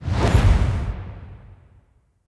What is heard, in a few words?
Finisher Start Accent; Weapons; Envoy